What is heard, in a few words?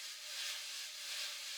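fast brush; Northstar - New Gold VOL-2; 50S BRUSH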